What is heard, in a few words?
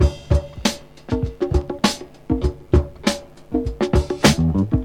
99 bpm drum loop d key, Free drum loop sample - kick tuned to the D note. Loudest frequency: 1490Hz